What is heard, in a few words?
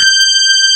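JV electric piano; Pro-Rec - Synthsonic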